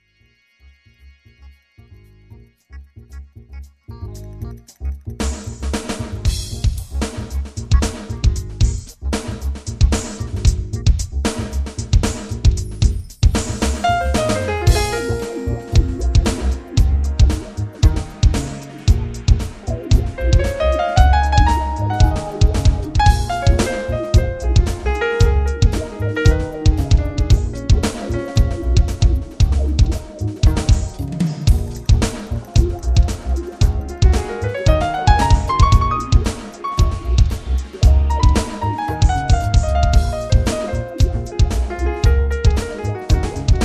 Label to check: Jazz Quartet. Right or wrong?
Right